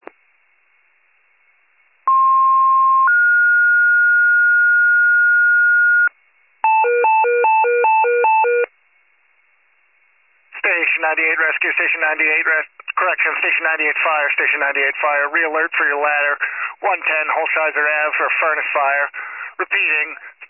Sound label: FIRE pager alert